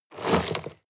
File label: shovel dig short